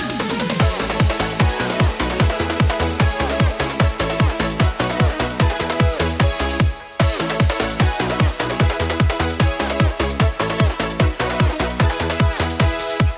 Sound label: euro beat; anime